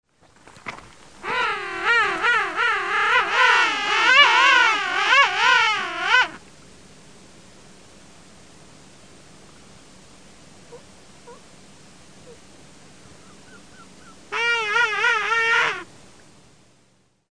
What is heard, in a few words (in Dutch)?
koningspinguin